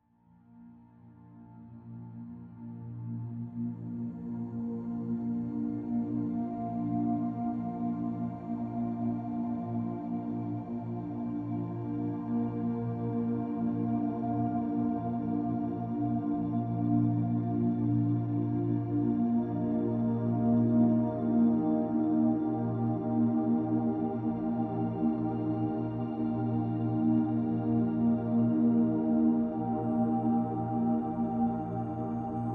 binaural beats sleeping music alpha brain waves sleep music